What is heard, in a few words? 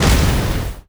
sfx skill, ZombieSkill SFX; IdleRPG2024